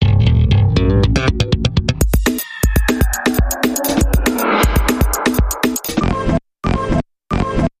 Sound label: Eclectic Percussion Bumper Reversed